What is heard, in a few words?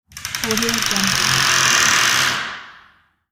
creaking door